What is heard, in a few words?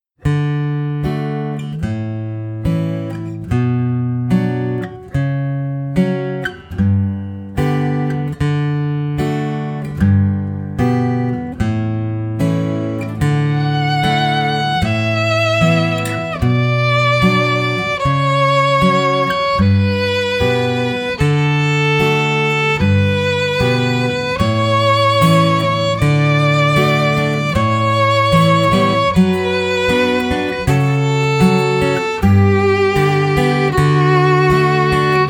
canon in d